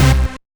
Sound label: RAVIN MAD, Synthy Tonal; Distored Reality